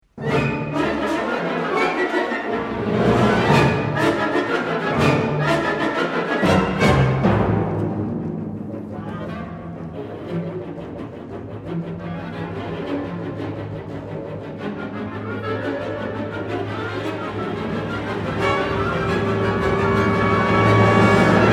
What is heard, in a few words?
OBOE CONCERTO